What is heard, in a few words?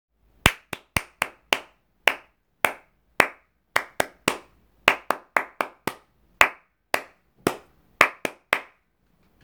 Clap student; Collaborative learning